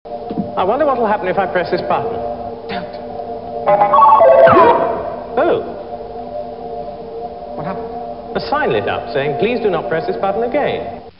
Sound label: button